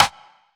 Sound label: snare organic; snares